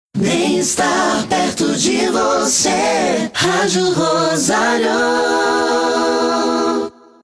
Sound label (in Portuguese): VH ACAPELLA BEM ESTAR; shopping; ROSARIO